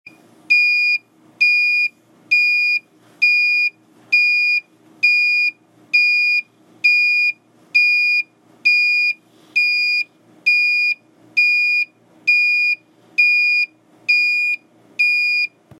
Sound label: Exhaust Gas Manifold Alarm